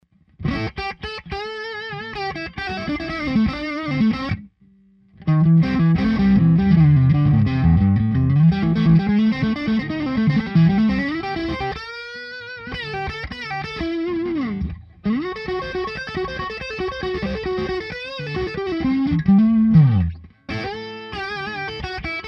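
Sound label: Neck Pup, guitar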